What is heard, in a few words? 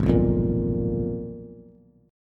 Ab Minor 6th Add 9th, Ab, Bass; strummed; JGuitar